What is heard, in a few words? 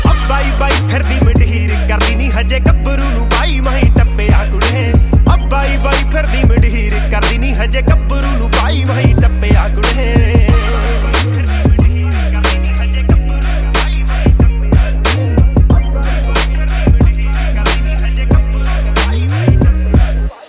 Punjabi Ringtone